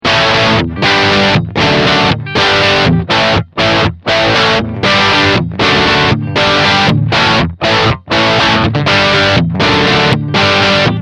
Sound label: Boost